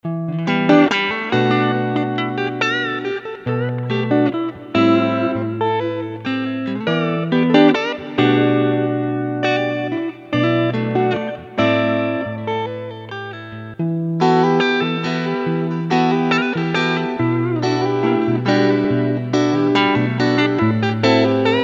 Loose Guitar Grooves

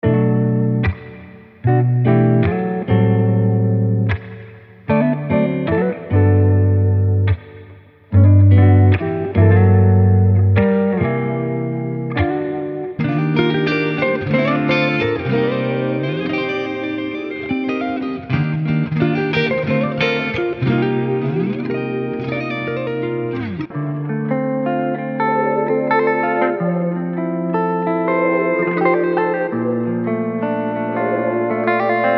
RB Guitars

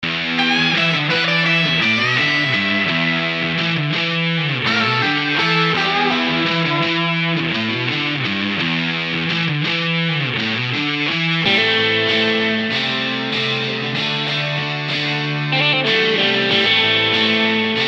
Indie Rock Guitars

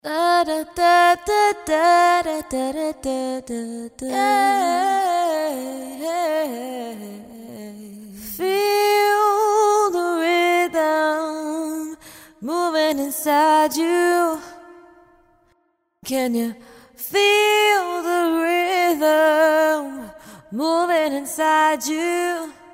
Smooth Vocal Hooks

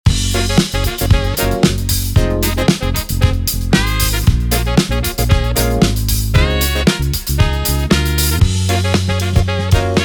Funk Essentials

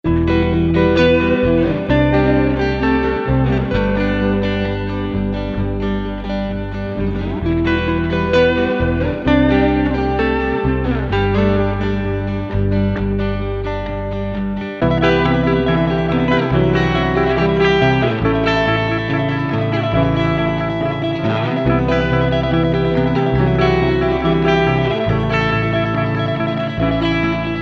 Stacked Indie Guitars

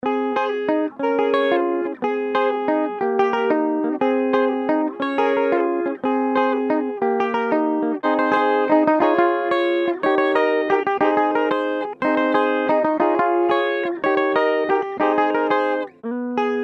African Guitar Riffs

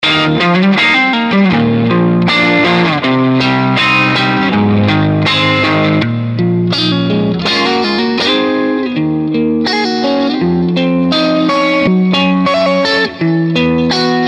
Funky RB Guitars

